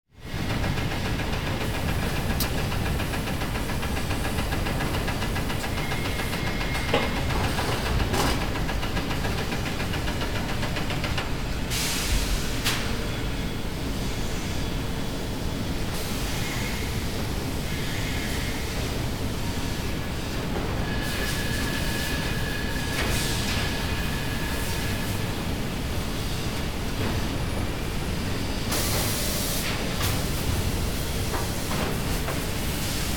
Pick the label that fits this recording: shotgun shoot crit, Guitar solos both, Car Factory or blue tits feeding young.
Car Factory